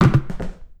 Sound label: bone uaz hits cone single; Call of Duty Modern Warfare